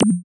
Retro FX; Cymatics; Video Game Sample Pack Vol 1

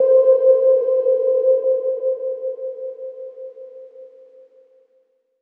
SYNTH (C); Everafter; Cymatics; Mystery Pack Vol 4

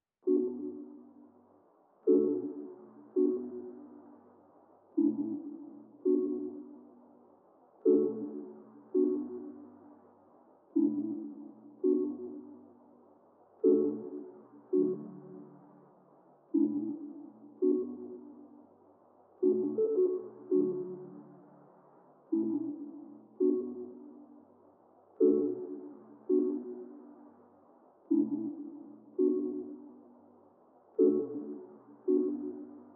166 BPM B Min Main Pluck, Loop Stems; Melodies; Cymatics; 2022 Melody Mystery Expansion; Midnight In Seoul

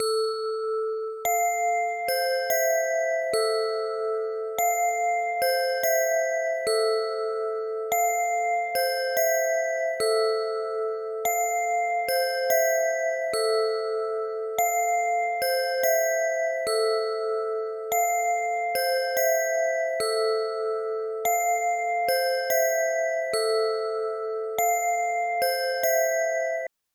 144 BPM D Min Bell; Cymatics; 2022 Melody Collection; Wavy; Jupiter

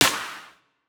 Snare, Dubstep Snares; GhostHack

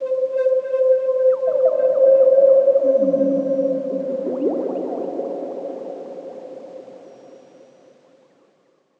PAD (C); Extraterrestrial; Cymatics; Mystery Pack Vol 4

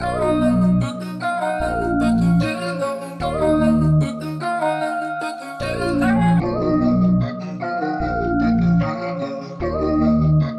150 BPM D# Min, Melody; Cymatics; 2022 Melody Collection

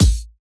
Kick, A; Drums; One Shots; Kicks; Humble You Kick; Cymatics - Humble Hip Hop Sample Pack

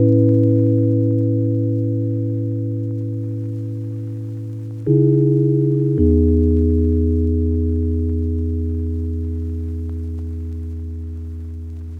80 BPM C# Min Chords; Vortex

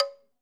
Krin - C#; percussion; Cymatics - Infinity Beta Pack 2.0; Zeus Percussion Collection

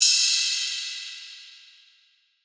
Ride; Cymatics; Eternity Sample Pack; Drum One Shots; Cymbals

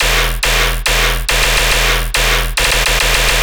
Bass Loop E 140BPM, Dubstep; Moonbows Bass; Ghosthack - AC2024 - Day 13 - Dubstep Sounds 2025

